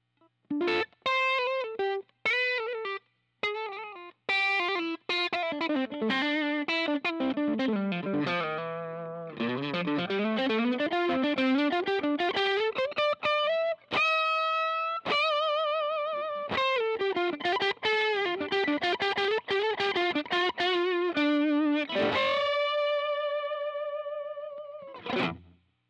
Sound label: drive, guitars